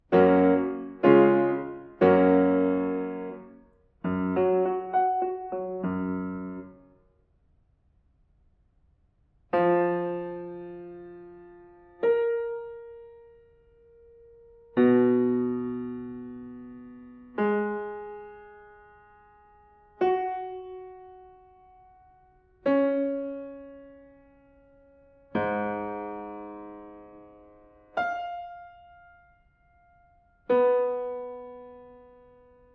diatonic collections

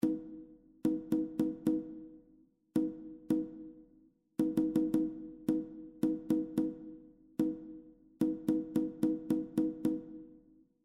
rhythmic error detection

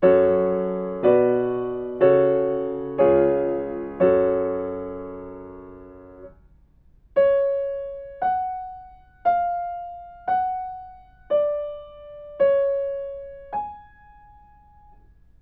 melodic fragments; ear training

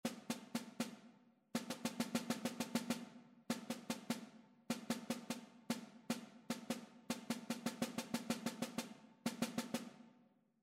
metric modulations; ear training

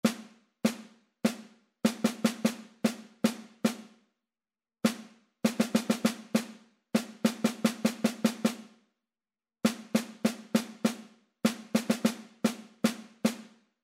rhythmic error detection

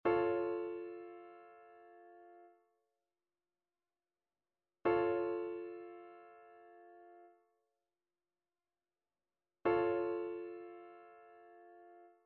trichord; trichord identification

